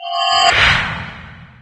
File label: pickup; doom3